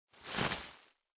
Packaging Air Cushion (Rustle); 梱包用エアークッション; ガサガサ; 梱包材

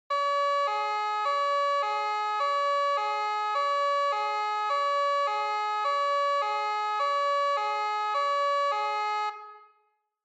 Police Car Siren Close

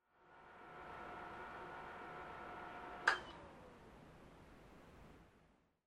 PC Operating Noise Far Forced Shutdown

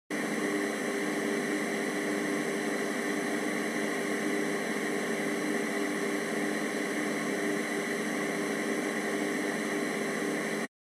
Radio Signal Noise FM

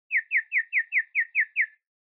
Motion Dizzy Slow Short

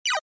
Shooter Shoot

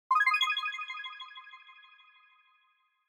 Multi Accent Short High

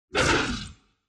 Monster

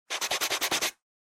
Eraser Rub

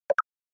Percussive Accent Mid

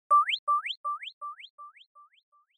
Anime Motion, High Delay

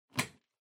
Power Strip Floor Hard Unplug

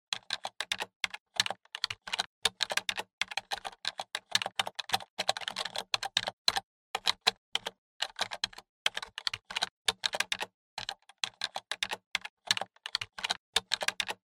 PC Keyboard

